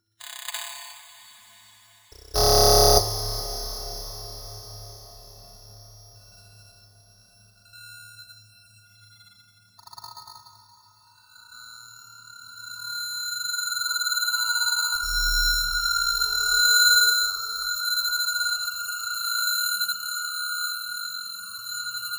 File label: Guitar Scrape Stretch; Sonic Foundry (Sony Creative Software); Loop Noir